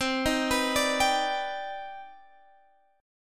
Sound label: C Minor Major 9th C